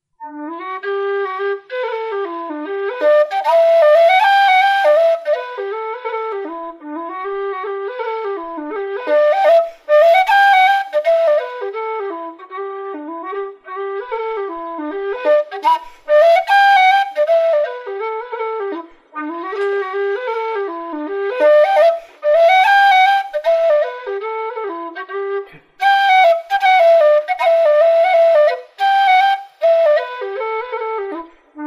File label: Wedding Samples Hornpipes